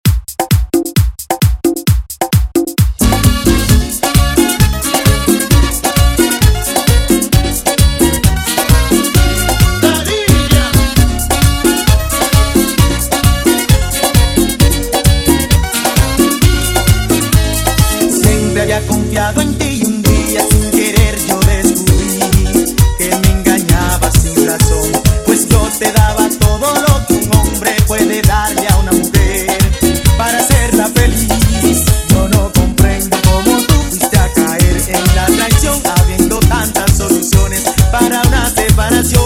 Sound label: 132bpm, Intro Outro